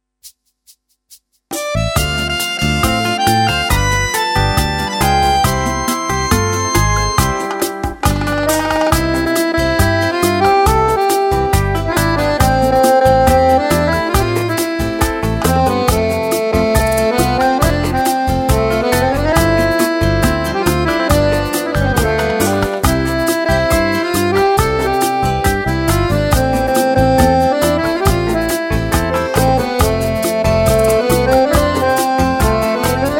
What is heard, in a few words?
BACHATA